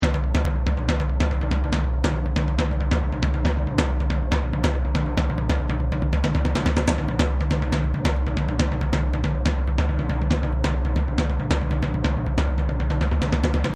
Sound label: Aggro Agg loop drums; Outdoors Old